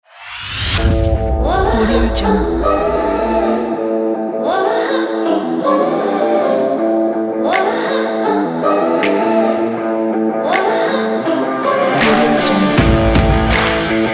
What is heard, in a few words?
western country rock; alexrockbeat